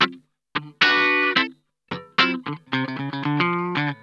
GROOVIN, Funk Guitar; Zero G - Funk Guitar

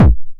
909 BD Tape Sat

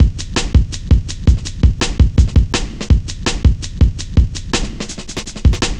Cut Thru B, Drum Loops, Jungle, 165.5; Zero-G Jungle Warfare 1, 2 & 3

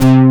POWER BASS

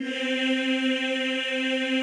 EEH C3, Classical Choir; Best Service; Extended Classical Choir

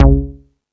Rap Session, E-MU Pro-Cussion

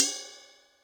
Bell Tip, Cymbal, Yamaha DTXpress

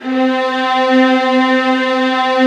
VIOLINS, Synclavier Strings; ILIO

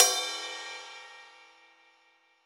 Pop Ride Bell, cymbals; Roland BK-5

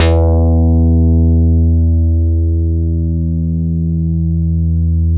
BS MIDI, Synth Bass; Roland - Rhythm Section

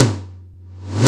WS TOM, DRUMS; Analogon

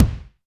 Kick, Alesis DM5

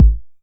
909 BD; Goldbaby Tape808 & Tape909; The Tape 909

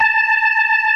ORGAN, C3 NO PERC; Keith Emerson Signature Series

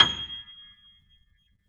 G 6, Keyboards Acoustic, GRANDP ST; USB Soundscan vol.17

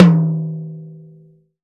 PT1 FF, TOM POP

